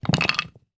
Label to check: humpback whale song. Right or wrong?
Wrong